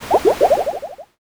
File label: poison